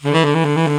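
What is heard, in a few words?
TRILL LO D-E, TENOR SAX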